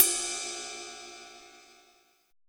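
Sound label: ride edge; Drums In Your FACE!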